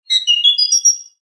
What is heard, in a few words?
Bird tone